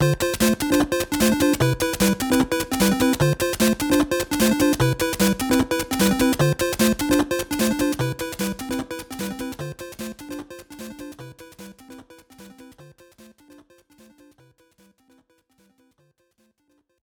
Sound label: Bonus Screen - Invincible BGM